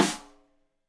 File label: Rim Shot Stereo; Deeply Sampled Drums; SMDrum